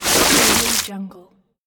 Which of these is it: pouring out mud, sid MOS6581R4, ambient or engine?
pouring out mud